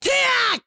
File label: Attack